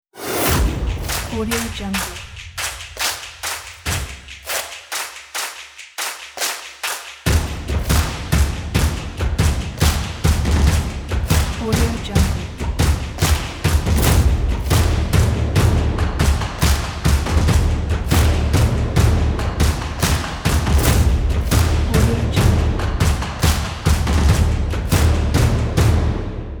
trailer action drums; inspiring-sounds

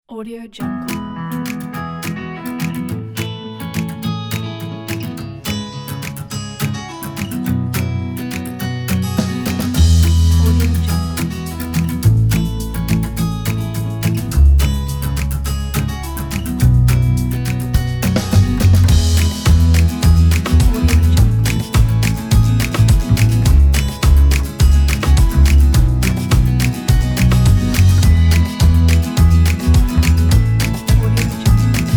upbeat summer folk acoustic

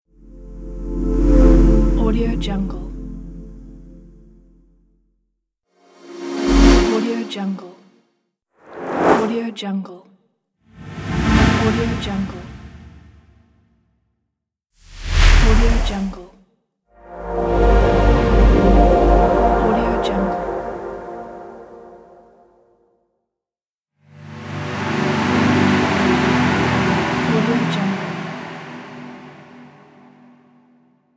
transitions

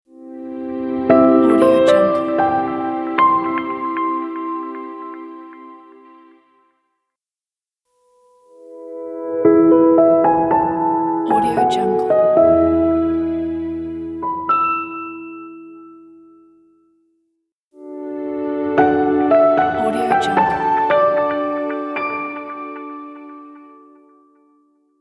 ident piano pack

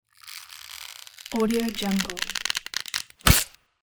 rope breaking

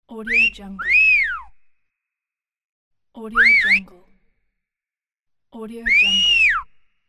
whistling

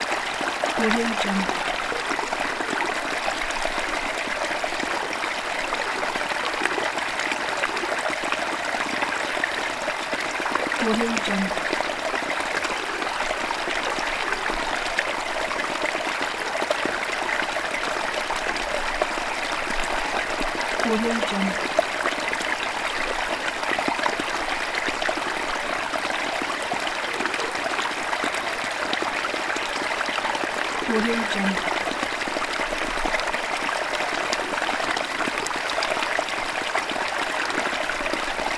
river stream; alina-sound

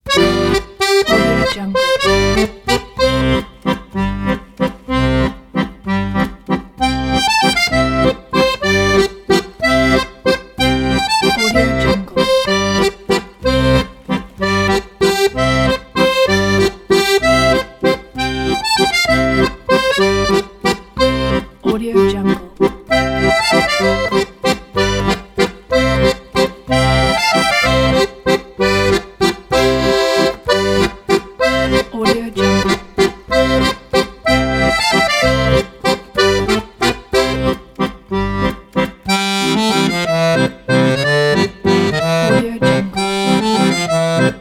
german folk song accordion solo

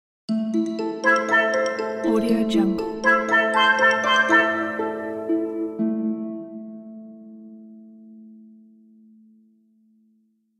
harp funny

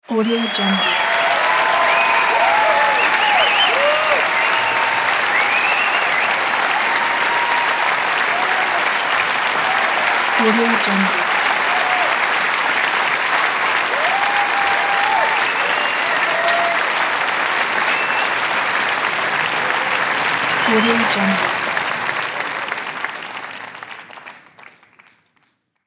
concert applause

